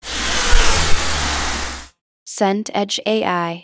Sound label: car pass fast